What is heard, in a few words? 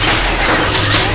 gurp; quake2; megatron